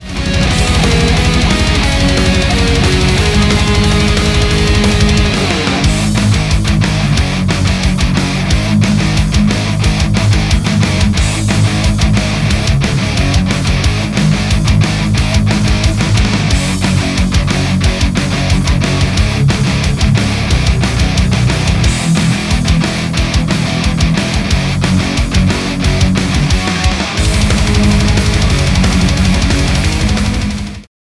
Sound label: Karaoke